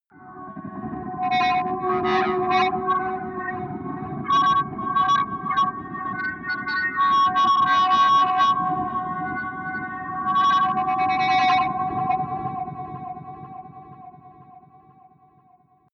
atmospheric feedback distortion; musikelemente

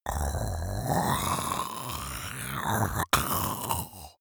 monster choking zombie idle; comedy-cartoon; soundpool

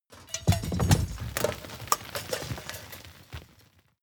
shrapnel and dirt fall

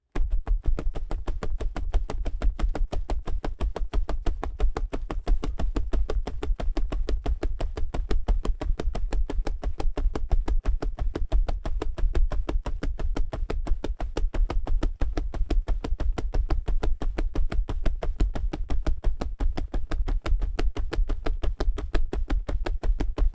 step troops run sub layer; schritte

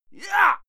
male fight scream yah short; schreie; menschen; soundpool

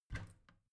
bathroom cabinet both open regular; objekte; tueren

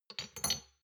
shot glasses pull out soft; berufe; gastronomie; soundpool

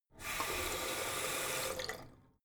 tap flow light short, badezimmer

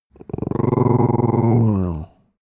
dog small growl soft; natur-tiere; hunde

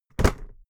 briefcase plastic drop heavy; industrie wirtschaft; bueroarbeit

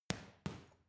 boxing punching bag side hook double soft; sport freizeit; boxen

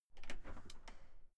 entrance door wide open outside

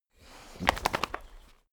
dogo argentino whine shake; natur-tiere; hunde

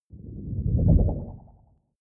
fly by wobble ship short; swooshes-swishes

